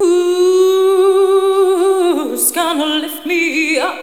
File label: VOX SUNG; Megabass Remix! 2